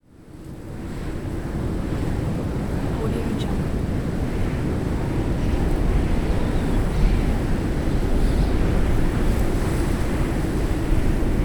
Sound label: wind